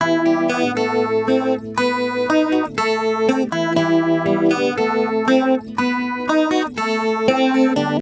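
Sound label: acoustic guitar stereo polyphase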